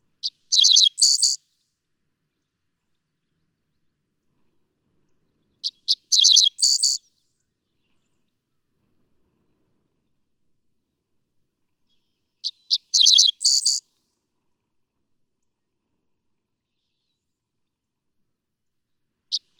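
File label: Dickcissel, longer recordings, Missouri; longer recordings from those three individuals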